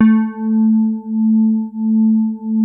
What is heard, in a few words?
SOFT A2, synth pluck; Music Store - Claudius Diemeter Schwerlos